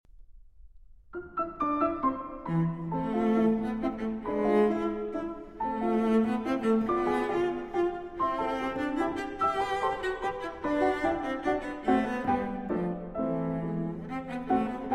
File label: Cello Sonata in E Minor, Allegretto quasi Menuetto